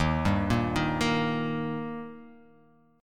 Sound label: D sharp Major D sharp, chord